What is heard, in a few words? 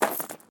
Knight Left Footstep On Gravel With Chainmail